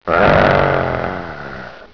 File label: GROWL